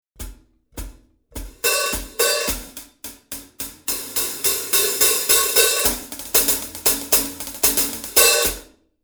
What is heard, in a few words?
regular hh, cymbal; sabian